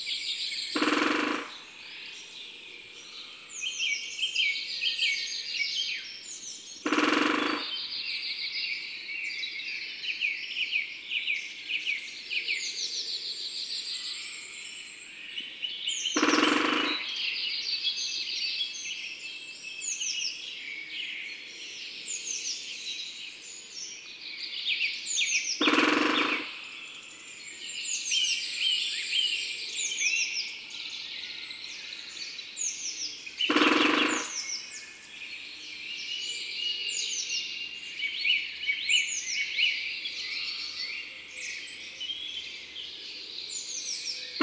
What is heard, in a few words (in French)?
Pic epeiche tambourinage, Drome; Concerts Naturels de la Drome; Studio les Trois Becs